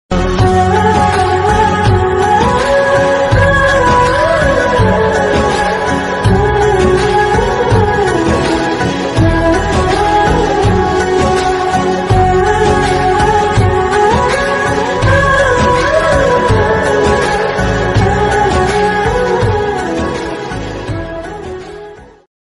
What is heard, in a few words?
bgm flute ringtone; ramayana